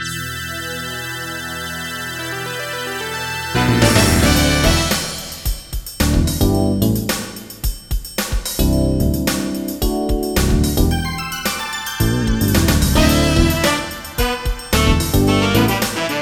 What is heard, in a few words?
funk; General MIDI